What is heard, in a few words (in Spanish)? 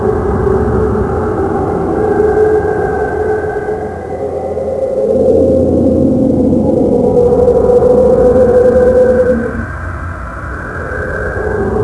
voces